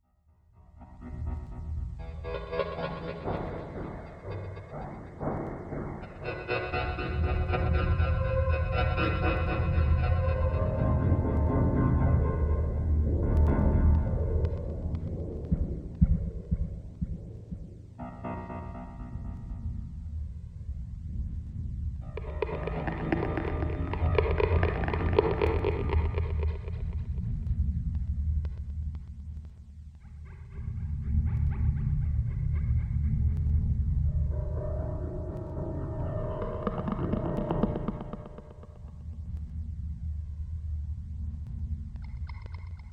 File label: G Phrygian 60, Deconstruction